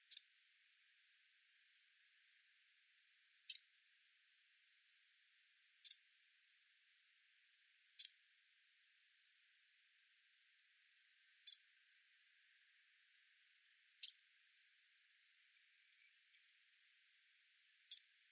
hisago

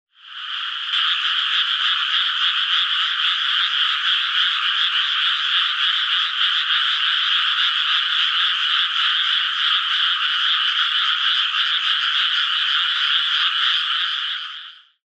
amagaeru

